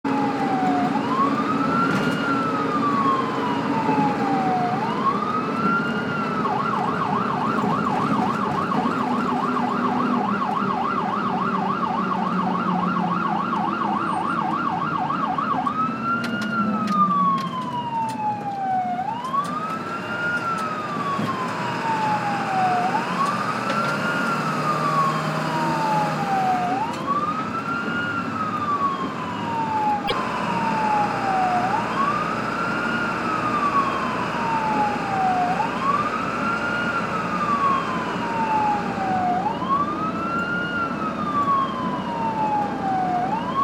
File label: Ambulances and Firetrucks; Blastwave FX